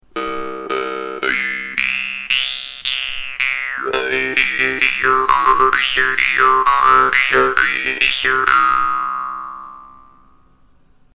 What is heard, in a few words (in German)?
maultrommel c2; rabach